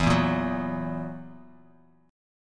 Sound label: E Suspended 2nd Flat 5th, Bass Standard, chord; strummed; JGuitar